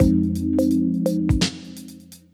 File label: Bleep Bloop Snare; BB 2HTC Samples Vol 4; Weird